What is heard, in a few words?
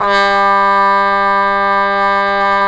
BRS TP, Roland, TRUMPETS; Roland - LA Composer vol. 1